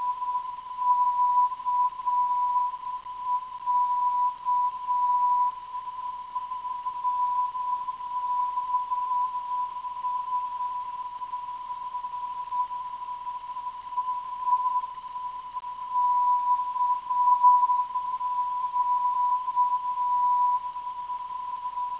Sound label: 411.03kHz, NDB